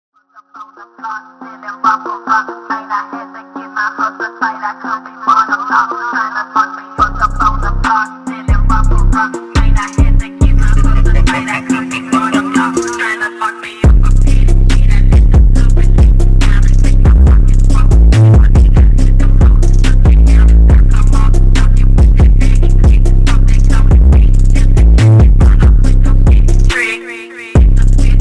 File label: BASS BOOSTED